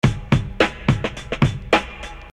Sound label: Beat, Hip-Hop Street Beats